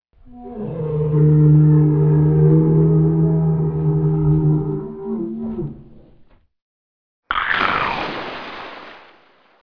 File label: animal roar; animals